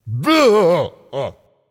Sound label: ugh; smite; voicepack; khepri